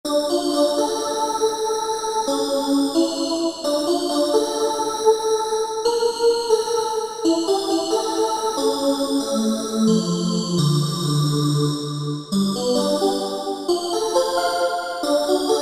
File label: SYNTH TEXTURES Vox; Ithaca Vox; Apple GarageBand 2